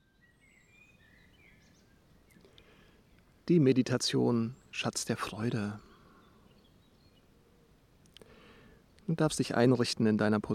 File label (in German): Vogelgezwitscher; Schatz der Freude